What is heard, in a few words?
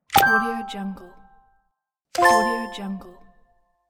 slot machine button